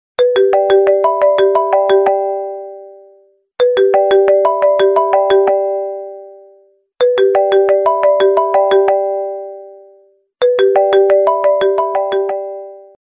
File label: Apple Ring